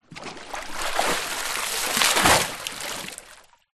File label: nature water movement surface splashes deep; zapsplat